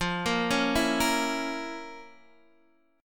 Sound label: F Major 7th Suspended 4th Sharp 5th F, Guitar Open D